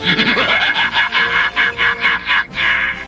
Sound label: laugh